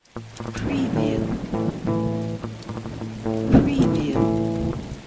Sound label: Power Bastaki A5 Crunch 105BPM, electric guitar; 6-8; beatcraftpro